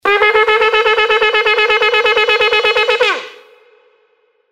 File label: Shofar Terua; Rosh haShana; Holidays; EZToddler